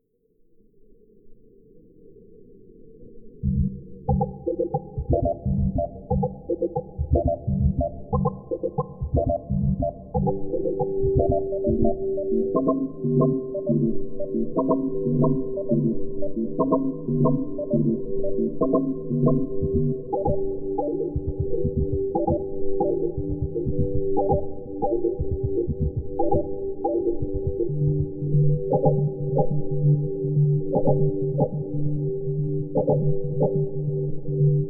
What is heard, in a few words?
Beat On Res Bells